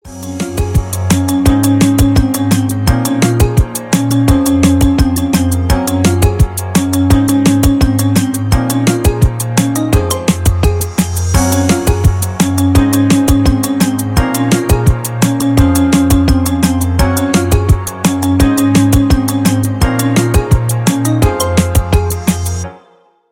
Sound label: marimba remix